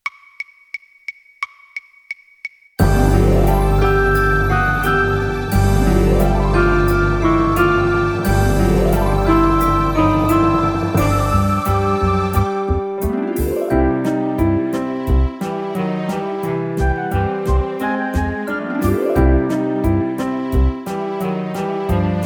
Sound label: On with the Show Orch. with Piano